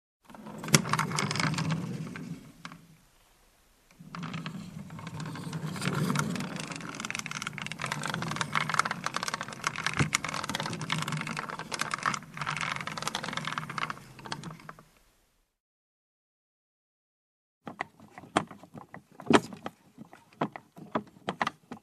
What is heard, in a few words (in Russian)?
zvuki tolkaniya ezdi derevyannoj telegi tachki. edet skripit.